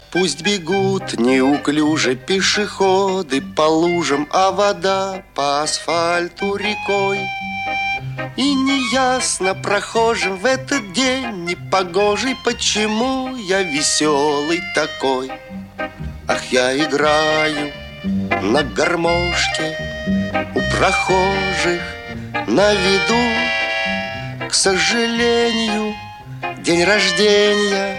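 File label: Russian Ringtone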